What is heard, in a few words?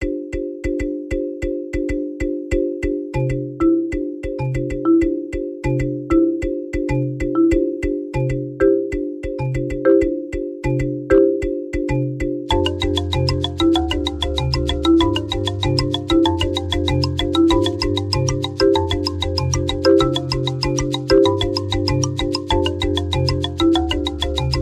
Insistent Marimba